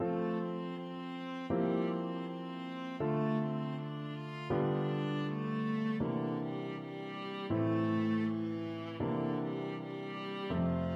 viola

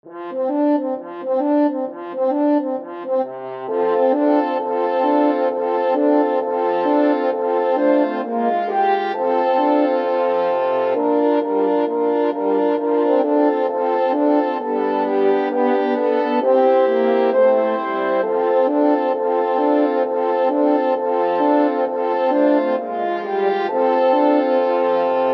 jingle bells boogies, french horn quartet

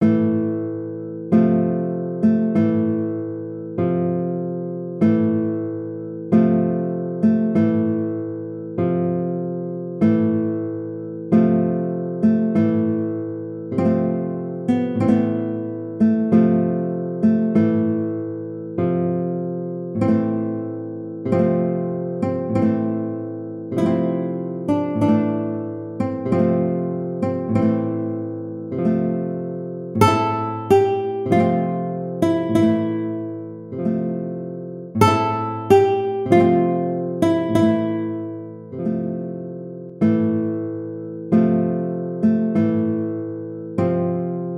Funeral March, guitar; Chopin

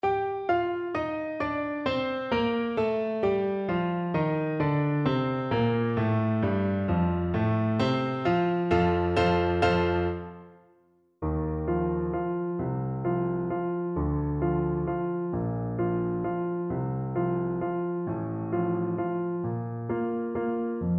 Bassoon

